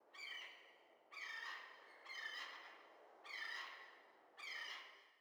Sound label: sting wild bird; Rust